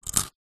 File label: human crunch potato chip crumb